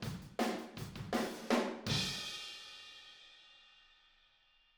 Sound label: Outro Room ST, 162 BPM, Drums; Drums from the Big Room The Mixes; Take Your Toms Off